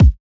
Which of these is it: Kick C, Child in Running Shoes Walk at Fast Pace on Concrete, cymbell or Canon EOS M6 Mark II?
Kick C